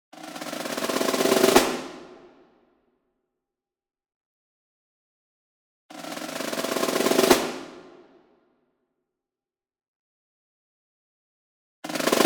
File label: SNARE Pearl Marching Roll Medium; SINGLE; boom library; Cinematic Strikes Construction Kit